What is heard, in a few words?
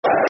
Rangers (Clap), Football Chants; Queens Park Rangers Qpr